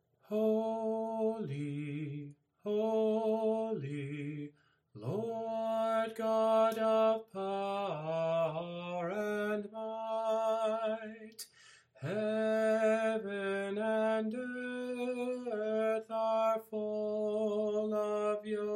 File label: Sanctus I melody only